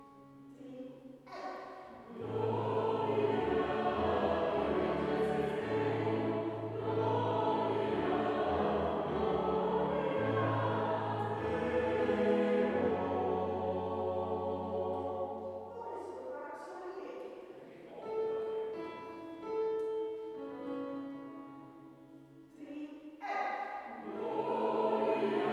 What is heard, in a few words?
Taize Gloria Deo SATB